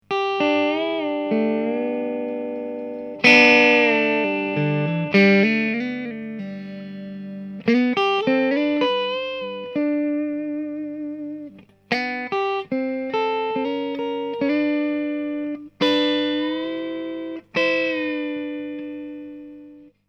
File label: Tele Brass Bridge Neck PUs